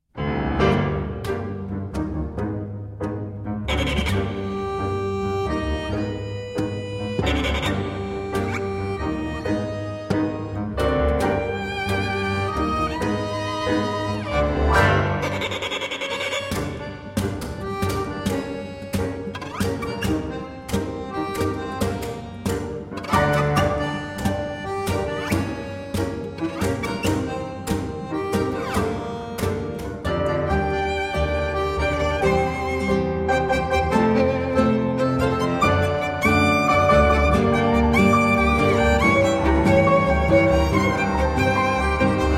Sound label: Tango